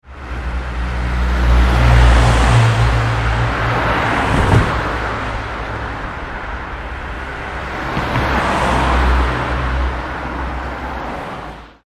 ambience urban traffic night town, Town Traffic Ambience (Night); Town Traffic Ambience - Medium Light; Traffic and Cars; Backgrounds; McKinney Sound